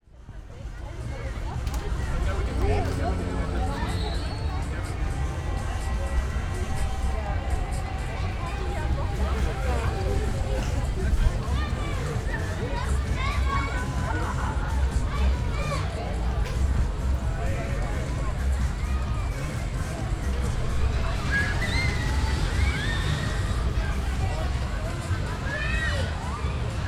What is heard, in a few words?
Amusement Park Sound Enviroment